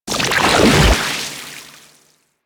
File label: Liquify Exit; Warframe; Warframes; Pirate